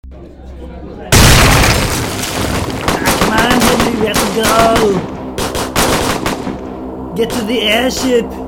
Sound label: Drill pod